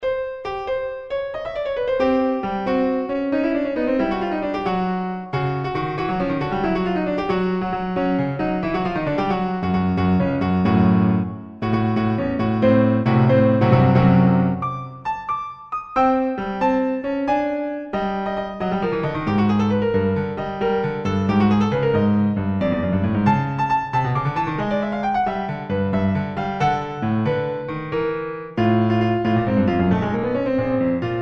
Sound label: Piano Prelude